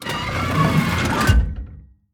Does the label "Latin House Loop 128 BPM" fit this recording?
No